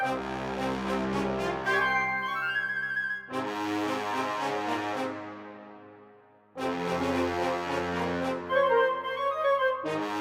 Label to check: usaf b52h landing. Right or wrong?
Wrong